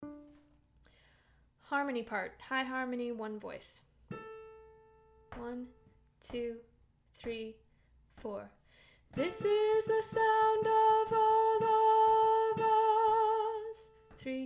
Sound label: one voice - high harmony